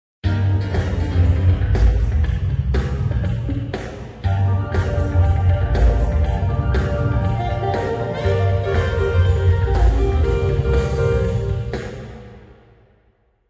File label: music 2000ms big room